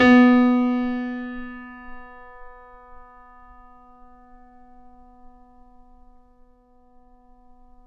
Mf B 6, Grand Piano off mic

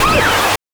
BANK2 SFX, NES DPCM; MIDINES

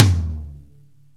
PCM TOMTOM; Crypton - DrumBase5000; CFZ KIT 2

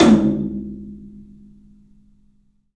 3-D Percussives, LOW Toms

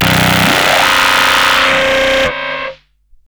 Car Trash, Hard & Loud Techno; USB Soundscan vol.01; AKAI

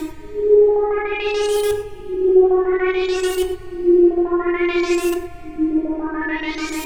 Synth Melody; Eclectic Electronica

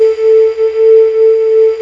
LED, S-LEAD

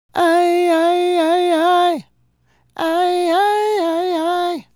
Male Solo, Hip-Hop and RnB Vocals

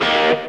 GRUZZ RIF, Stereo Guitars; E-MU Formula 4000 Series Vol. 1; Hip Hop Nation

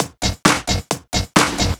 Dance Grooves

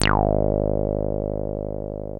303 F#2, TB 303

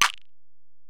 Ac Perc, Drum Machines Alesis DM-PRO

